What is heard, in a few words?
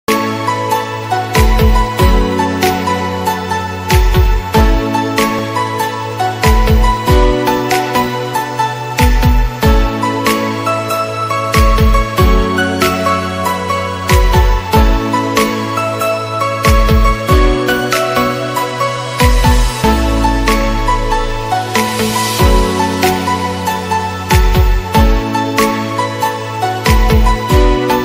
gujarati instrumental ringtone gujarati ringtone